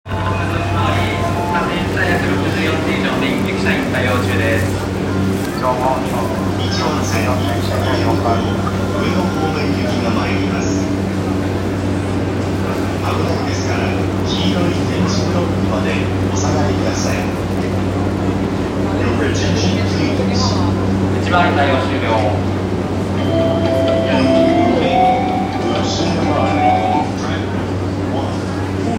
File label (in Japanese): JR 山手線 高田馬場